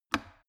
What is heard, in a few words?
plastic water boiler turn switch on